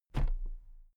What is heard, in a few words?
toolbox place upright soft